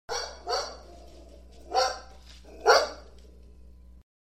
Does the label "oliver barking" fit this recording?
Yes